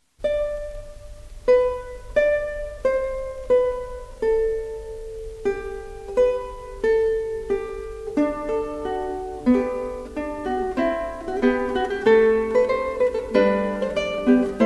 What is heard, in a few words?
lute solo